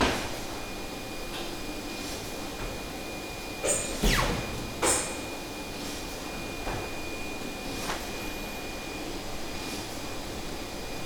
Print Shop Ambience Interior Machine Medium Close Perspective Robot Pneumatic Noise; The Soundcatcher